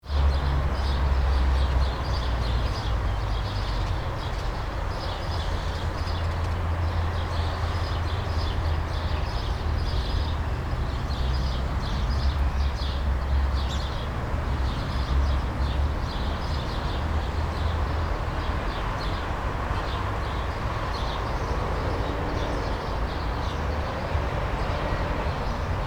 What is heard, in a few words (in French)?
nature bord route moineaux poids lourds